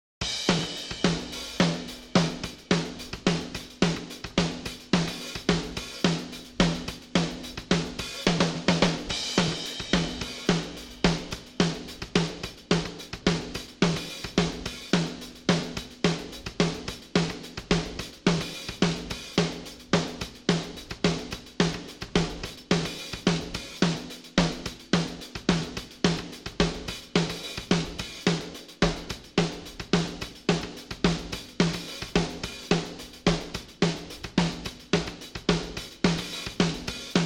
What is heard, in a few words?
drums